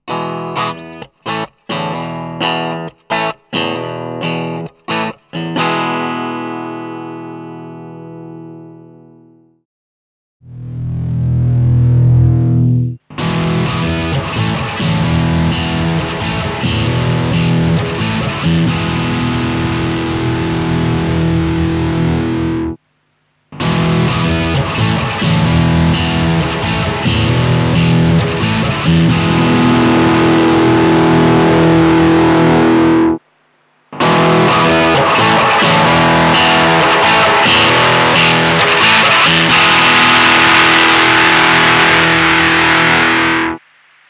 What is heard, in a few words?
deq-700